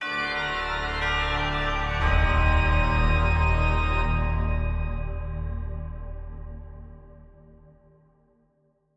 Stingers sting stairs

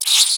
rat hurt; Rats

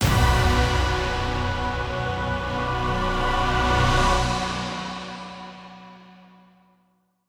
Stingers sting boss phase

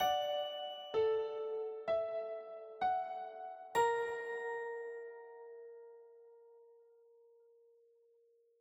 Music End

